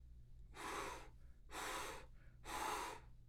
Puff

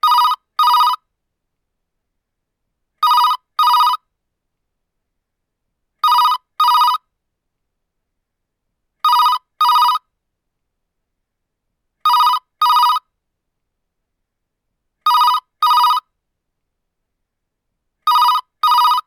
Phone Rings Type 1

